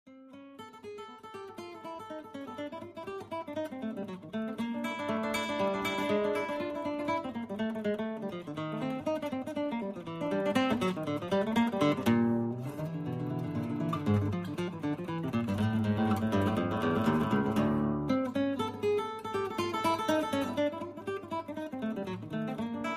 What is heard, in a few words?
guitar